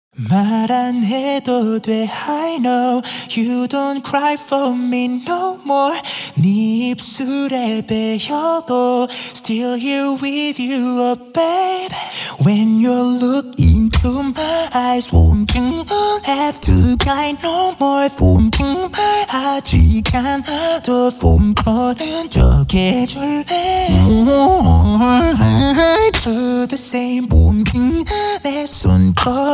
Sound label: beatbox ver